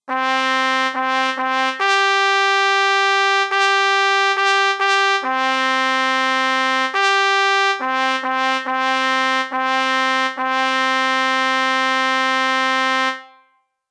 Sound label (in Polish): trabka; Trąbka, melodia 2; Nagrania Lokomotywy; edukacja wczesnoszkolna